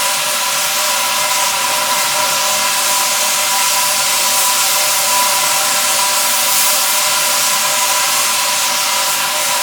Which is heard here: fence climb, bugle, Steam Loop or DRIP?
Steam Loop